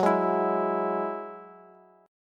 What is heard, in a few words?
Gb 7th Suspended 2nd Gb Bass; strummed; JGuitar